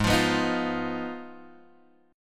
G sharp Minor 6th G sharp down Guitar Drop D

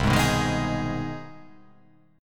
C Minor Major 7th Sharp 5th C; CmM7#5 strummed; JGuitar

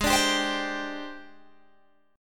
Ab Major 7th Flat 5th Ab, down, Guitar, Open G

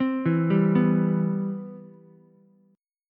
C Major 7th, C, down, Ukulele; CM7 arpeggiated; JGuitar

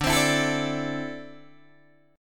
D 7th Suspended 2nd down Guitar Open D

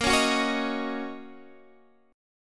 Fsharp 6th, guitar chord

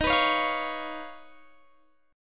Eb 7th Suspended 2nd Sharp 5th Eb

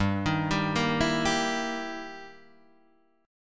E Minor Major 7th Flat 5th, G

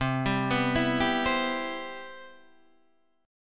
C Major 7th C

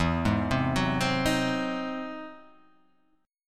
E Augmented Major 9th; arpeggiated; E+M9; JGuitar

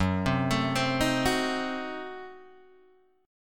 Gb Diminished 7th Guitar Open G

